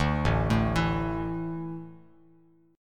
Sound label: A Suspended 4th, Bass Standard